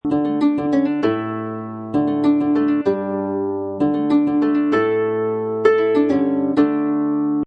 Harp Loop Dmajor 130Bpm, Harp Loop In D Major At 130Bpm